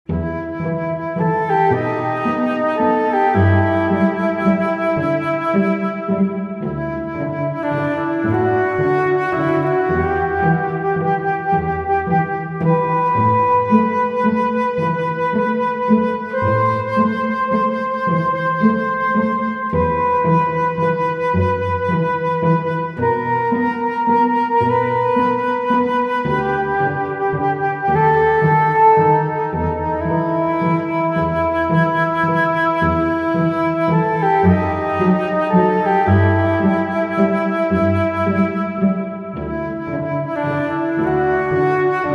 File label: str flute; es ist ein schnitter...